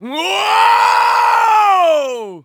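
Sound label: scream fall, voice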